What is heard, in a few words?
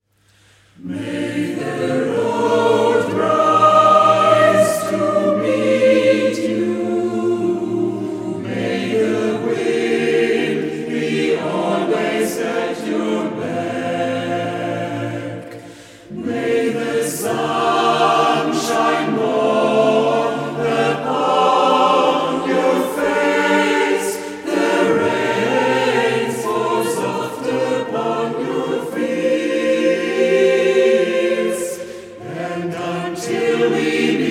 Irish Blessing, Chor